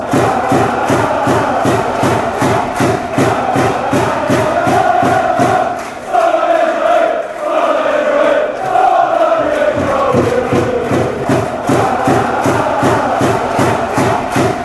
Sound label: ole, chants